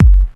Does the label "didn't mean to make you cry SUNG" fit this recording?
No